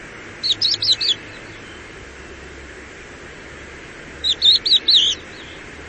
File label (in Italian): Cappellaccia Galerida cristata